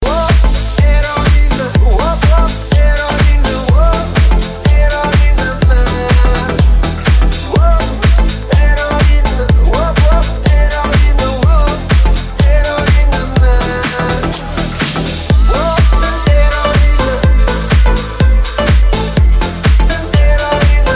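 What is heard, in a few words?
Hip hop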